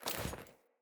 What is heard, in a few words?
Foley Run Default, Default Clothing; Player